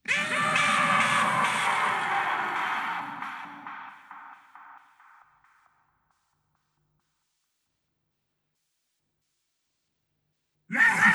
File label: Amb Ice Monsters